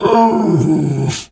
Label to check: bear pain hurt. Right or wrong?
Right